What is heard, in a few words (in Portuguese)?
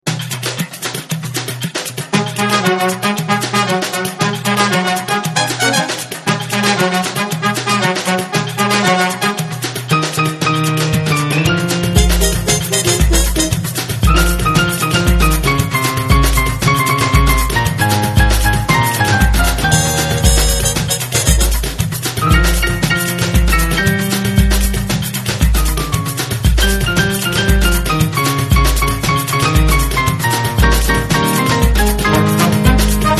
mpb samba; sampler mpb